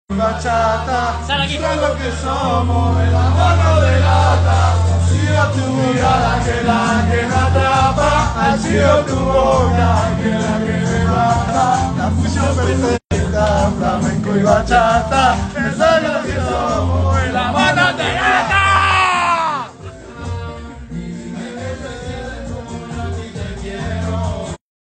flamenco y bachata

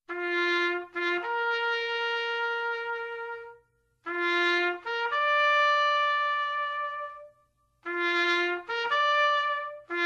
trompeta militar funebre

